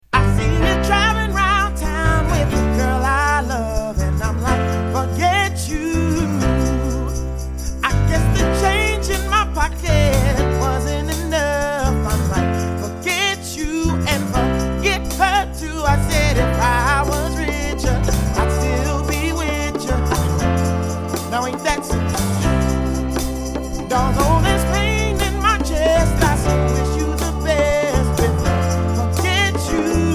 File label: Pop Band